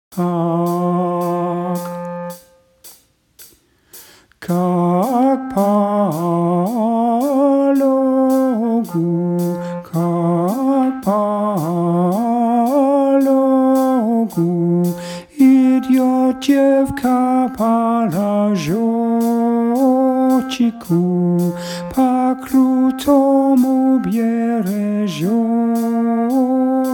Kak po logu Tenor

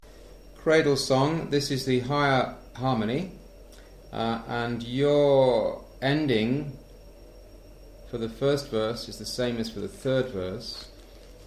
cradle song harmony

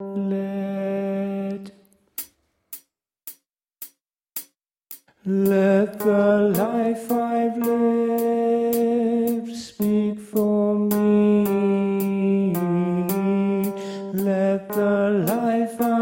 Tenor

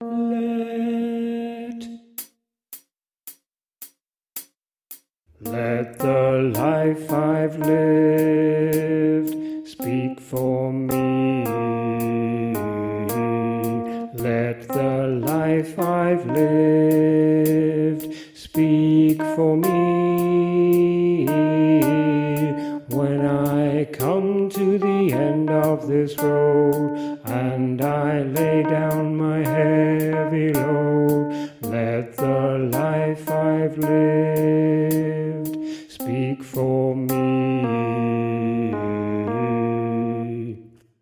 Alto sung in mens register

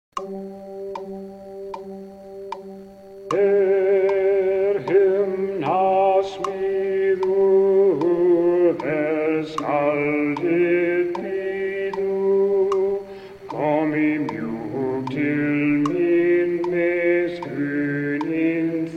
Bass

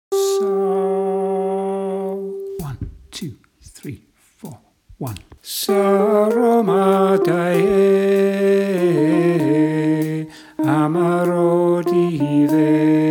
Alto

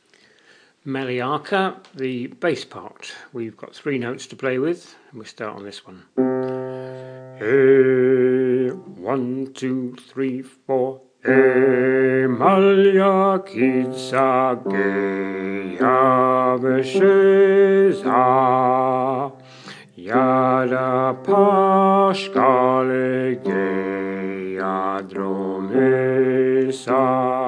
bass; Maliarka

